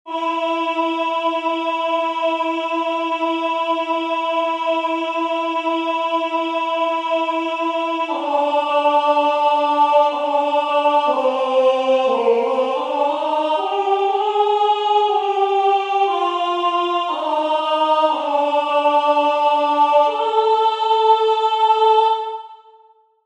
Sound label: Amen Alto